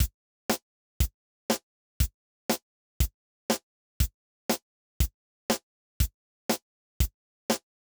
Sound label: acoustic kit gated